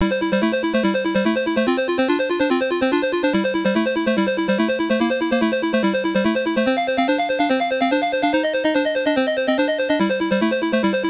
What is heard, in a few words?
Bonus Level; Xor World; arcade